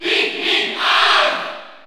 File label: Cheer French; Alph; SSBU